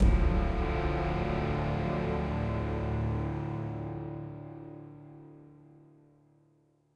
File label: Boss Walk; assassin s creed chronicles china; E01 Naan